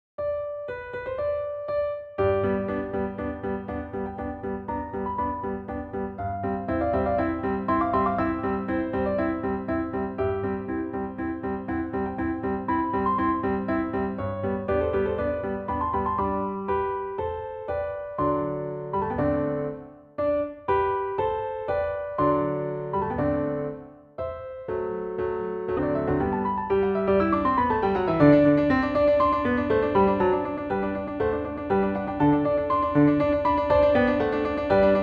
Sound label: Piano Concerto No 17 in G Major Allegro